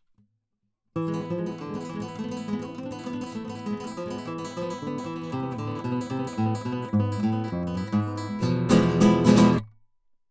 Kytara kobylka

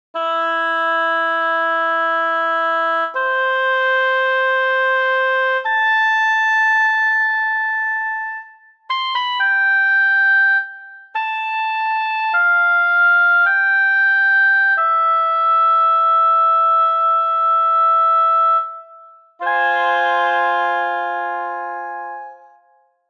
OBOE bez vibrata

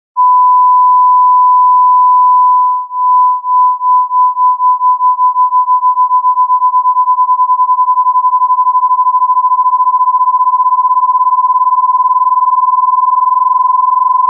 zaznej 1000Hz X 1030Hz pri stejne amplitude

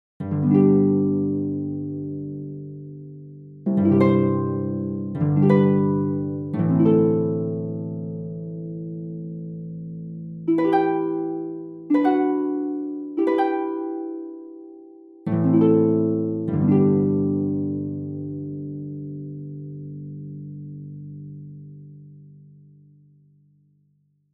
ARPA akordy

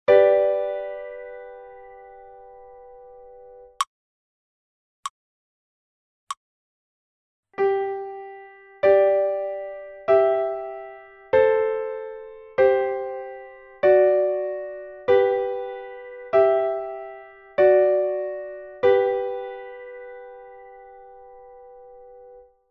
Dvojhlasa melodie GHD FAST

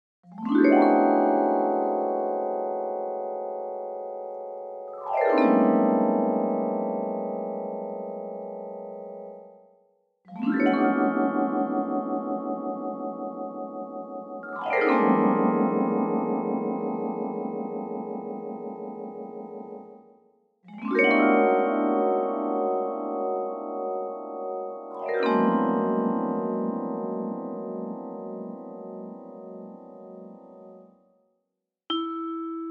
vibrafon

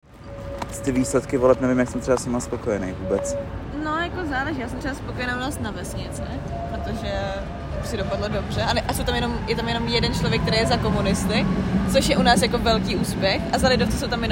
situace

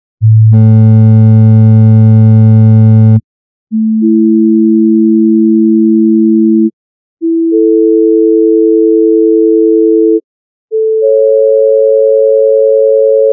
intervaly harmonicke rady

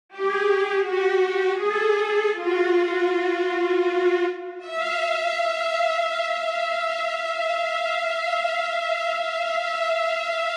VIOLE trylek

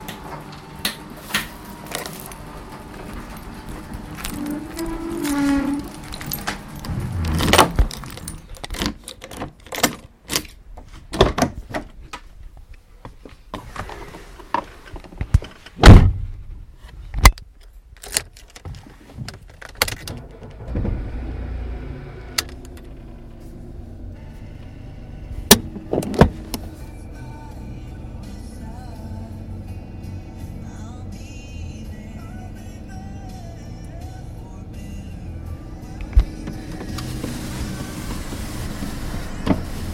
Ruch

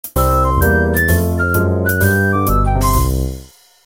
znělka

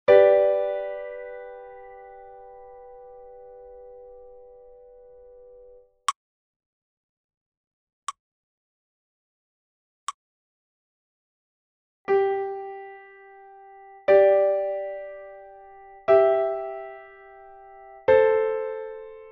Dvojhlasa melodie GHD

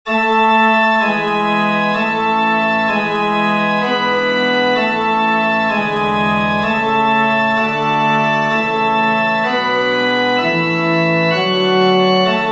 císař hymna

